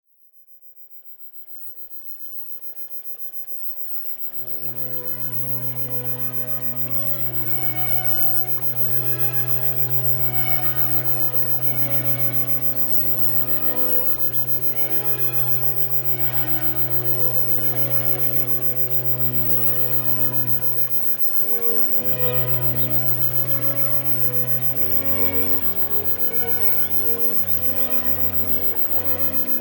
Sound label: pastoral relaxing stream